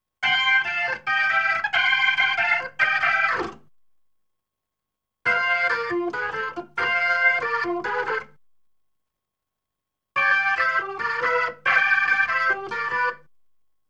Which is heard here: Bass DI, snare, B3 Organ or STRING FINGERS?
B3 Organ